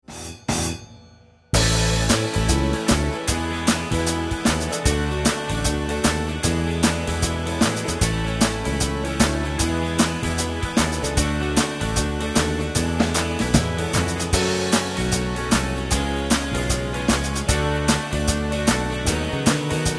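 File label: pop song drums + keyboard + 2 Guitars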